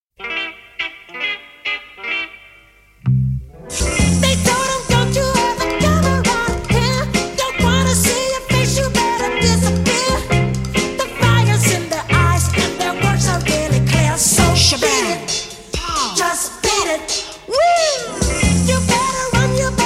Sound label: Comic Beat